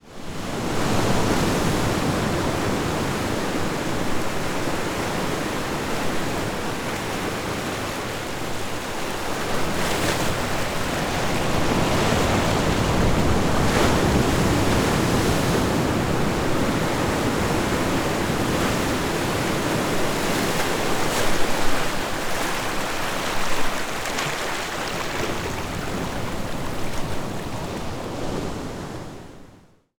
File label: ocean wave